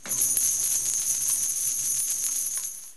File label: sequin coin